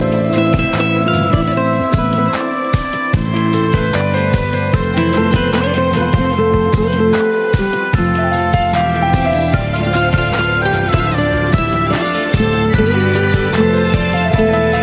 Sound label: great piano; classical